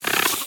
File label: say, spider